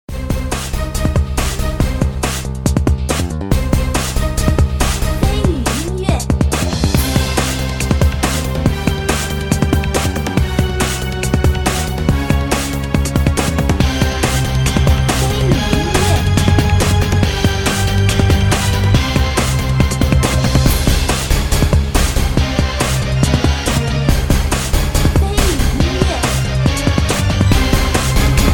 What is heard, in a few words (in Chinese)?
战斗 激情 紧张